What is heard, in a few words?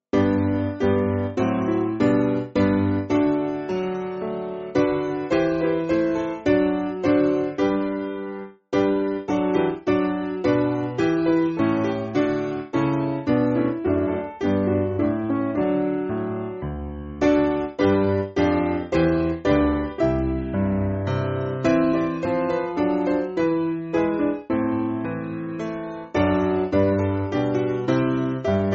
Piano; Ridge